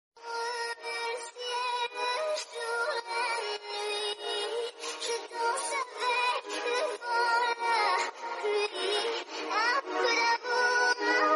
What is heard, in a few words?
message notification